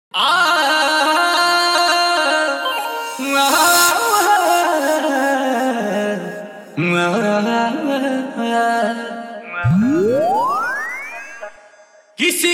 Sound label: Bhojpuri Dj Song